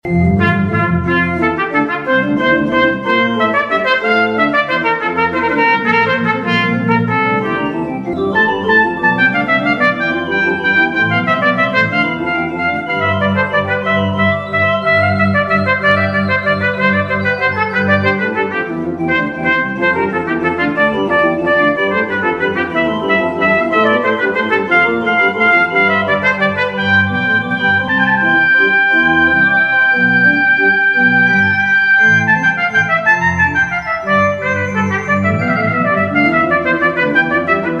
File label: Menuetto arr. for trumpet and organ; Brandenburg Concerto no. 1 in F major BWV. 1046; III. Menuetto; Rondeau